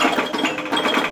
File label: Pinball; Ed Edd n Eddy; Adromulus Pack